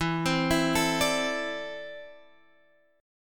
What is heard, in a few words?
E 7th Suspended 4th Sharp 5th; E7sus4#5; arpeggiated; 12,15,14,14,15,x